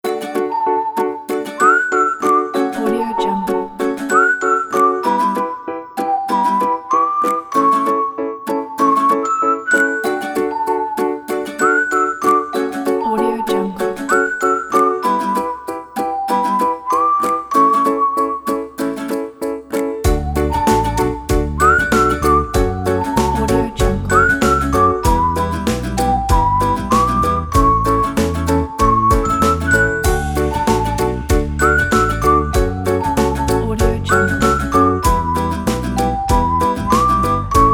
happy rain music

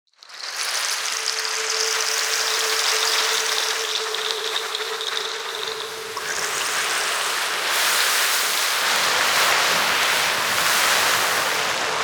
destruction rock sound fx; sfxtools

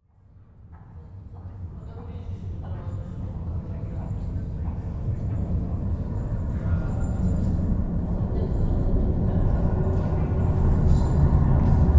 urban and suburban sound fx

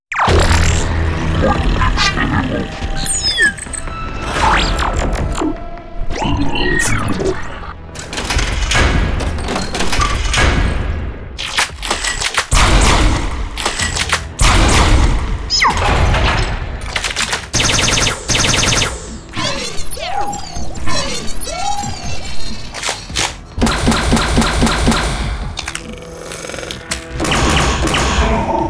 alien game sound fx; epic stock media

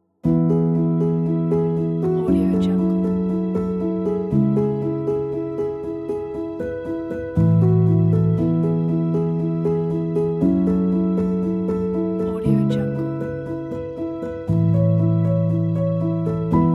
the beautiful emotional piano music

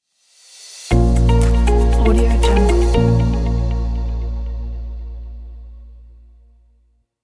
logo business music

